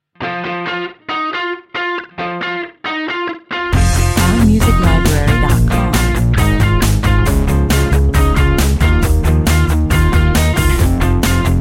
rock 136 bpm

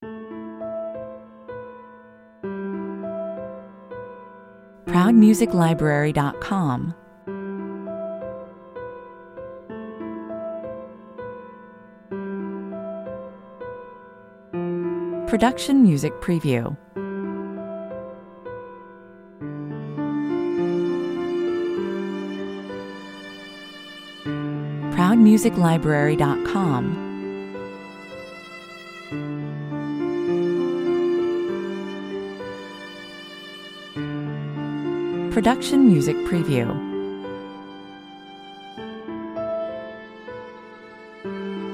heart break

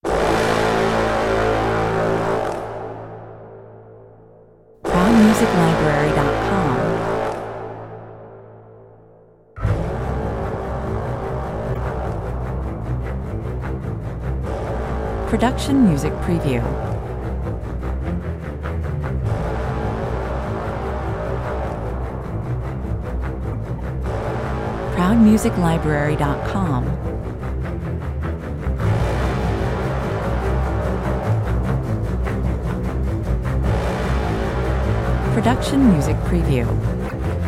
no choir

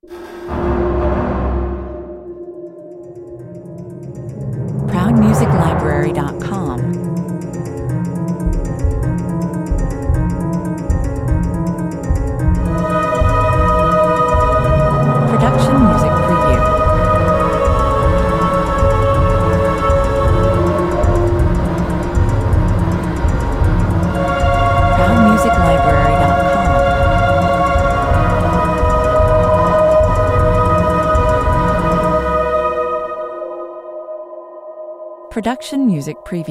horror 132 bpm

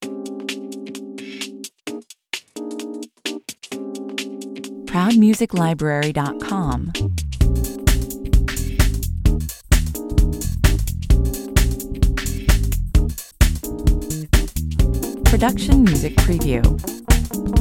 house beat

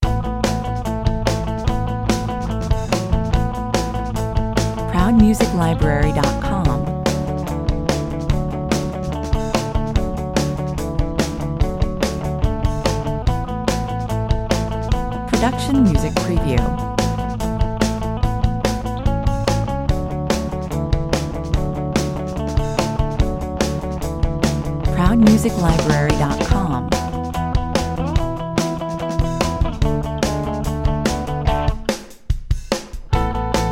indie rock, 145 bpm

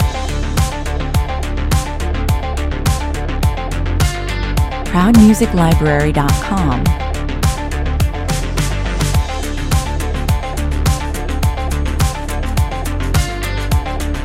long looping version

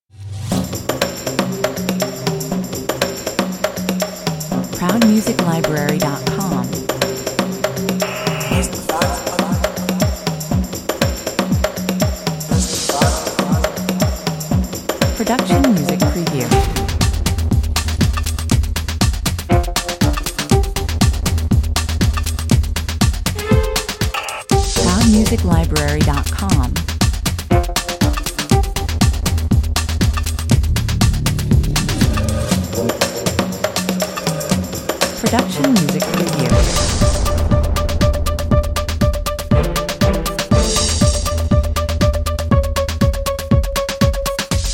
guerilla techno

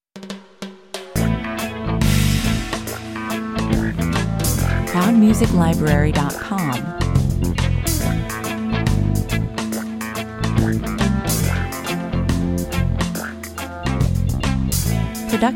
jamaican roots 70 bpm

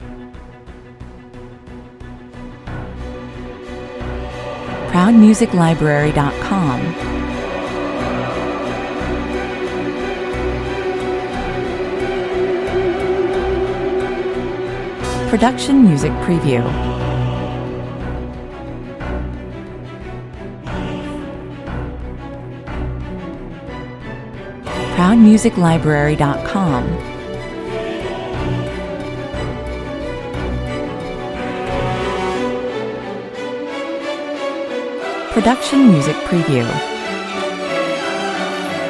no percussion

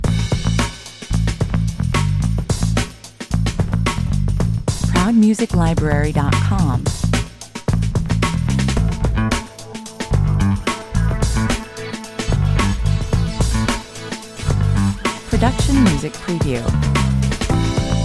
business loop, 110 bpm

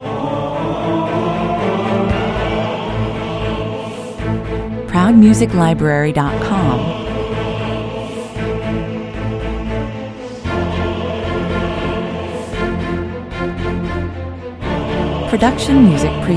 no percussion

